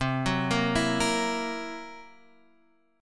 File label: C 7th Flat 5th C down, chord